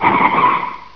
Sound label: SKID; Sonic R-SAT